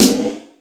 All Snares, E-MU Pro-Cussion

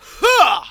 VOX SHORTS; Megabass Remix! 2

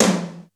HI TOMTOM, D110 DRUMS; Crypton - DrumBase5000

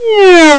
swoop, Amiga Soundtracker; ST-XX Amiga Soundtracker Sample Packs

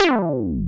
slide bass; FUNK

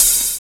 DR. LOOP, DRUMS; X-Static Goldmine 3

